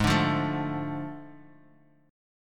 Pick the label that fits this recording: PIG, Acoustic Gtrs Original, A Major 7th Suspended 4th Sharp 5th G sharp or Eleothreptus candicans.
A Major 7th Suspended 4th Sharp 5th G sharp